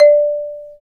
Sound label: Bass Marimba; Roland LCDP11; Africa VOL-1; Af.Marimbas